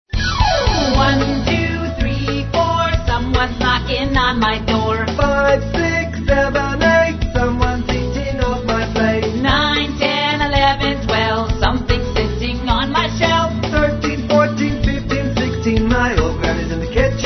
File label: counting song